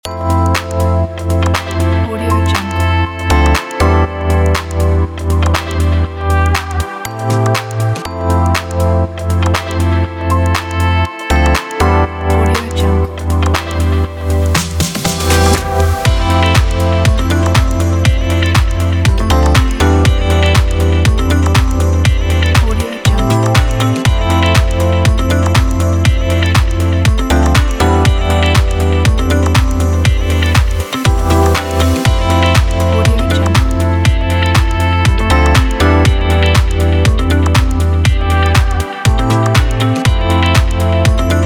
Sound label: celebration luxury